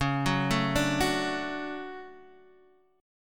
D Major C sharp, guitar

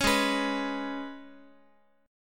G sharp Minor Double Flat 5th G sharp, Guitar; G#mbb5; strummed; JGuitar

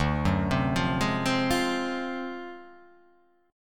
D 7th Sharp 9th Flat 5th D down, chord

